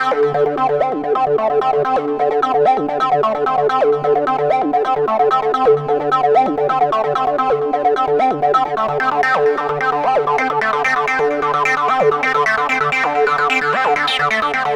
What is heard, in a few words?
Lead Arpeggiation, EDM; Sonic Foundry (Sony Creative Software); Afterhours EDM